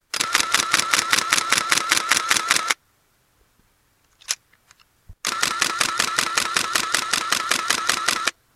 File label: shutter, canon t90